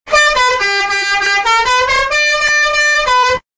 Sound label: car horn slowed